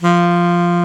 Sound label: SAX A. MP F, Alto Short; Roland LCDP07 Super Sax